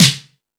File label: All Snares, E-MU Pro-Cussion